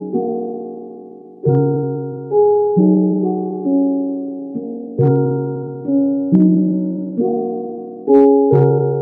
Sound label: minecraft starting song